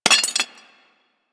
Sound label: Rifle Mag Drop; Weapons; Warframe; Thanotech